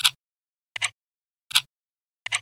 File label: tick tock 160bpm